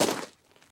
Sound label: flint step; Golden Crayon Sounds